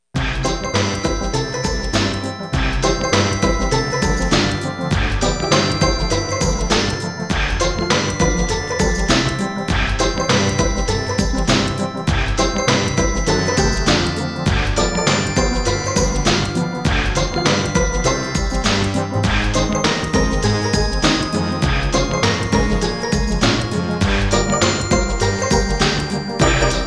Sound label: Menu BGM; Battle Pinball; 3do